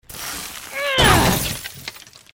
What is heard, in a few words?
Witch Hammer; Goddess of Trampling